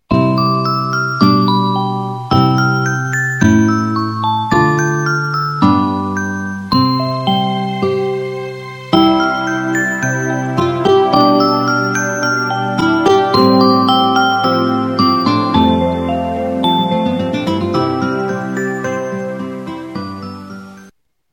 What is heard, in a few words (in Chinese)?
音乐铃声